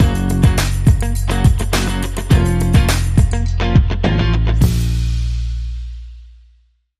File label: Bumper